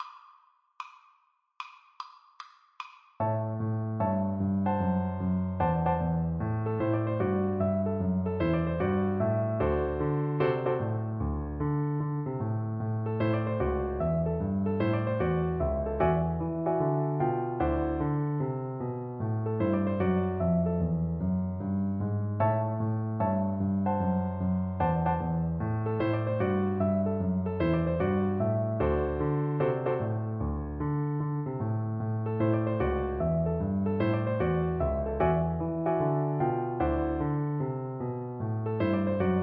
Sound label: shuffle violin